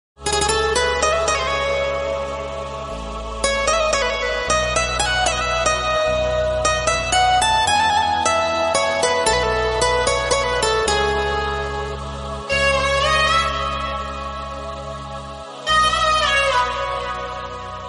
sambalpuri instrumental sambalpuri ringtone iphone ringtone sad ringtone